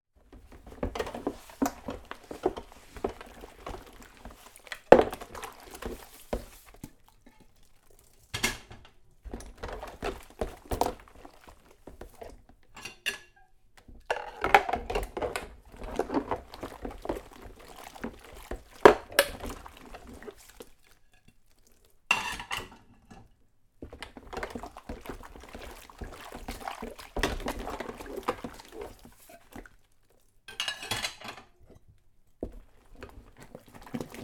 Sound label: household washing dishes place on draining board